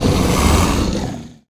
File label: wolf ride; mir2; crystal